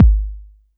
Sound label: BD